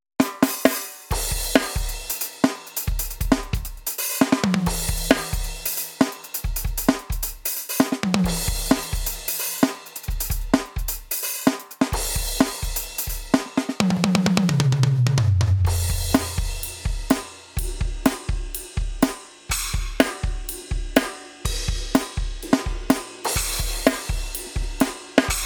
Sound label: YAMAHA DTX6K3 Kit9; Defunkt